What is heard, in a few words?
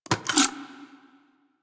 Fire Mech, Flame Thrower; Weapons; Warframe; Ignis Wraith